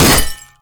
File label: bomb ball hit world, weapons